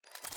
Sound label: Camera-Broken Shutter Rev